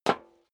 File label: step high heels metal dry single stair up; schritte